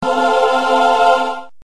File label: angelic ahhhh